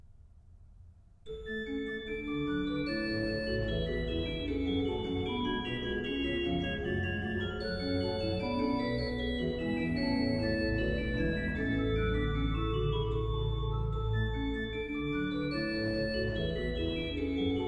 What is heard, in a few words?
ORGAN, Chorale preludes; BACH; BWV601 Herr Christ, der ein'ge Gottes Sohn; Orgelbüchlein